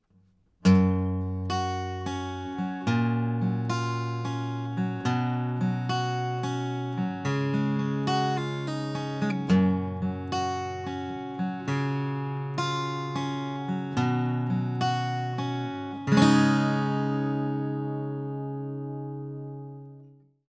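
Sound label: guitar pick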